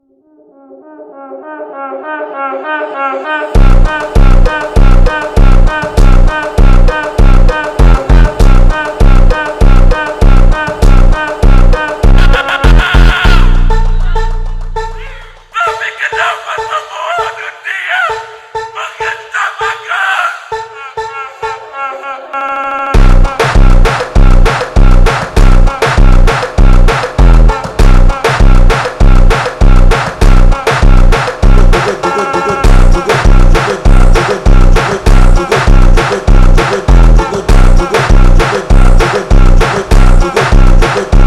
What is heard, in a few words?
Funk Slowed